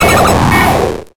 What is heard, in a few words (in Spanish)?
Grito de Wobbuffet